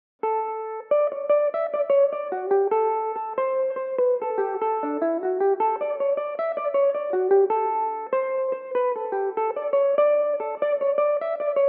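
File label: D major belmuse, irish mandolin; Valentine's Day; original tunes written and played by aidan crossey; Belmuse EM-190 electric mandolin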